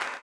CLAP, blues & soul; ILIO; Vocal Planet

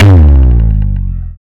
FUZZ SL, Bass Legends, SLIDES

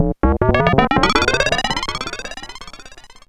RUNAWAY BLIPS, CRAZY FX; Zero-G Ambient 1

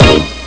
trumpets; Amiga Soundtracker Sample Packs

